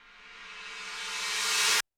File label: Roland TD-20 Percussion